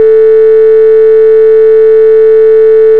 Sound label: sine 440Hz